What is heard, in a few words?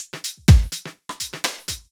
DEEPHOUSE BEATS 125